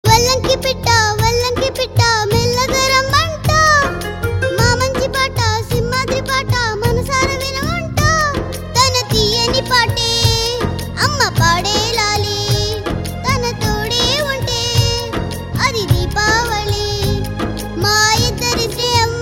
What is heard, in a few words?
Kid Version